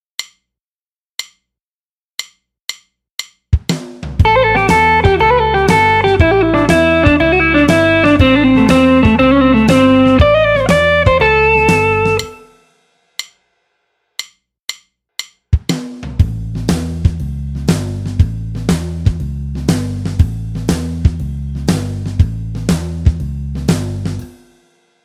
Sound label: Build Up